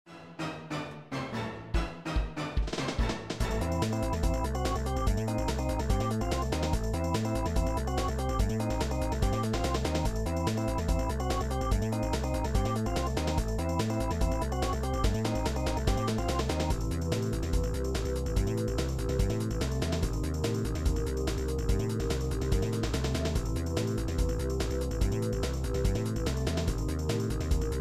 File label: Metal Cap Powerup; Super Mario 64 Decades Later; N64